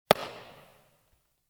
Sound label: globe cat